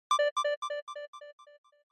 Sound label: Short Accent Delay; ショートアクセント